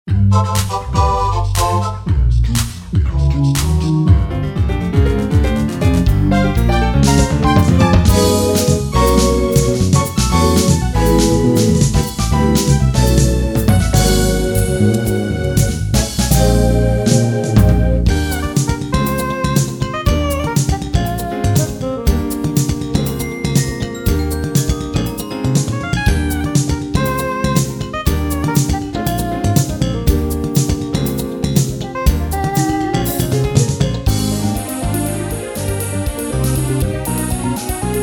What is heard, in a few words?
Casio CTK-601